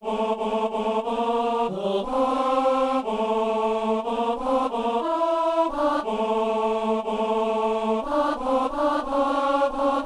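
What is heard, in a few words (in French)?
messe simple gloria ténor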